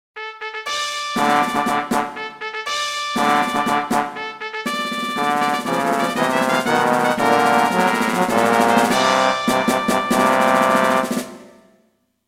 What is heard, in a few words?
fanfale